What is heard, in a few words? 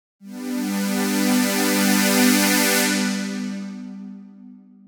Lush City; jup8